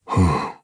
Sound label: Vox Sigh; Dakaris; kingsraid